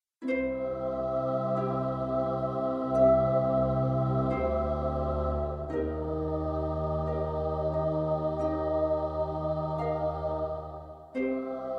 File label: Music; DS9FX